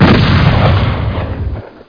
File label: shotgun; quake